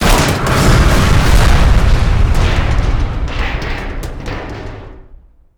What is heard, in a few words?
Explo Large Metal; Destruction